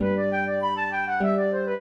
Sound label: trio, flute harp; Mozart